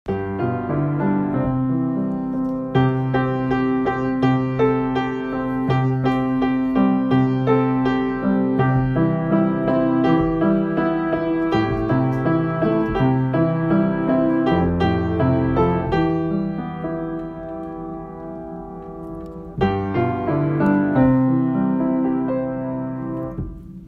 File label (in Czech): uvijime venecek hudba; Puntika a Pepiny; jaro